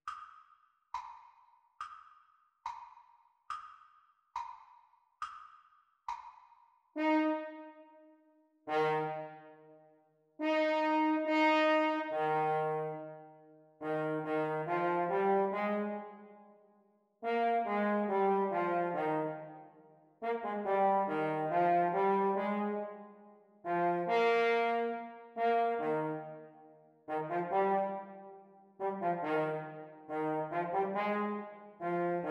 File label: French Horn Duets Playalong Practice